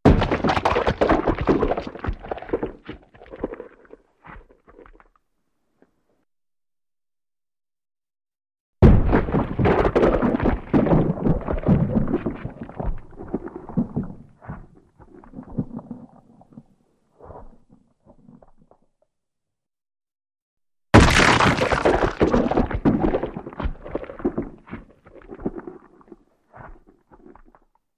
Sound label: Slower Big Rock Hits; rocks